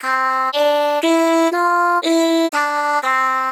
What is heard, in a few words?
sample inst